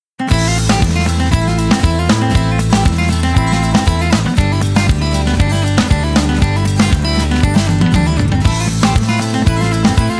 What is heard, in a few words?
Flamenco; super crazy rhythm castle